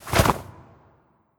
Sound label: Skel Scientist Throw; Legend of Keepers (gamerip) (2019)